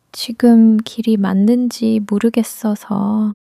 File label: struggle